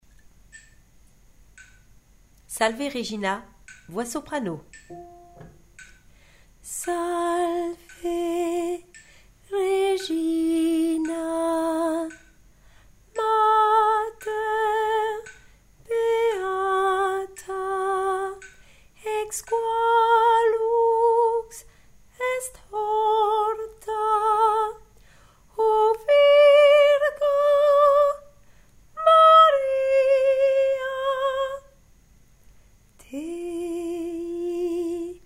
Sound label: salve regina soprano